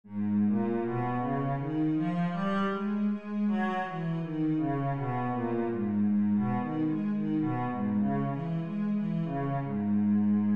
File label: g sharp minor, scales, cello